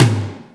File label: YAM TOMC, Drums & Perc; AKAI S6000 CD-ROM - Ultimate